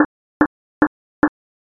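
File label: footsteps slipper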